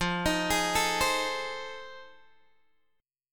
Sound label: F 7th Sharp 9th, Guitar, Open G